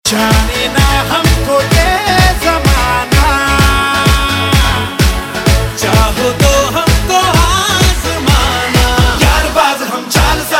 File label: Electronic; Bollywood; Happy New Year